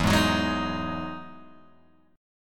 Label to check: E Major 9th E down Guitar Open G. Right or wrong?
Right